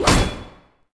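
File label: impact sword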